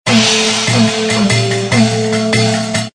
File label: WIN; Emperors China